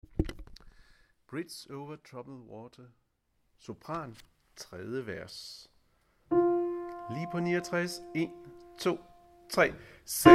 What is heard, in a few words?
sopran; harlev koret